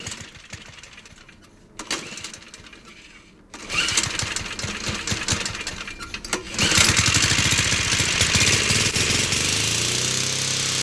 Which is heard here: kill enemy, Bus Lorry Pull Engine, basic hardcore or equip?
Bus Lorry Pull Engine